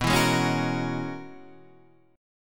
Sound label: Db Minor 13th B